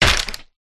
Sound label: skolopendra attack contact bottom